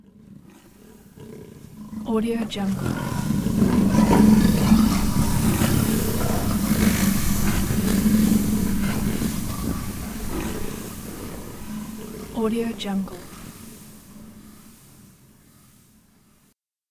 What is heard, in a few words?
ice creature snarl